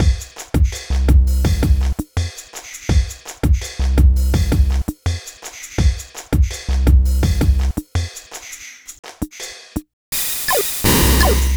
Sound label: gameboy break four bars sub